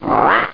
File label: pain; tnpdemo